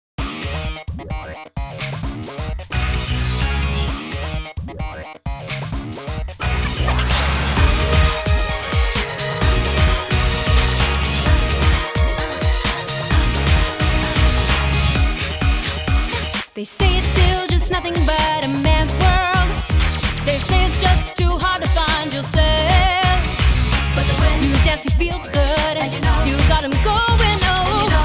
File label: English